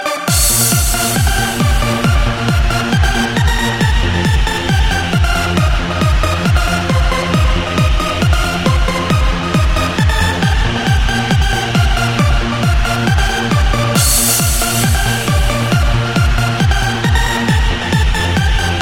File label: Techno